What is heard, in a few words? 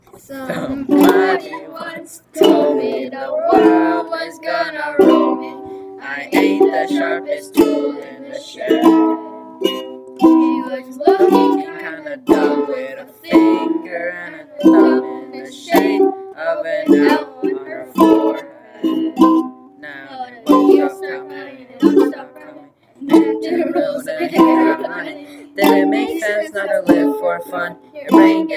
Uke